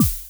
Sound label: bone Shot; hexhollow